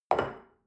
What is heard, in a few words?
shell upside down place on table soft short; berufe; gastronomie; soundpool